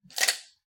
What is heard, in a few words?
metal stamp; soundscrate